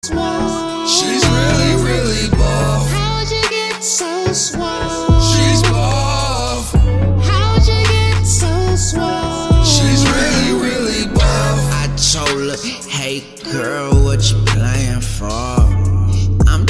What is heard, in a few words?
Slow Jam